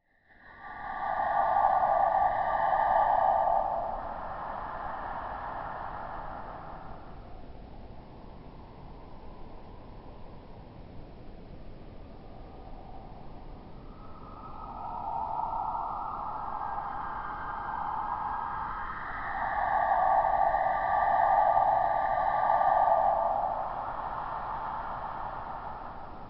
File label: Supernatural Alien Birdsong